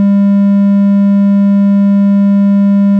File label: 200Hz Triangle